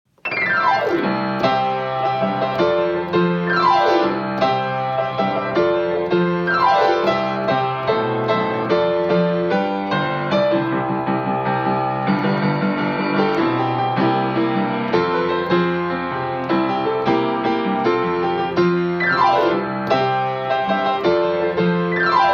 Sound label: piano; Music Minutes